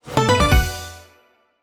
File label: small Win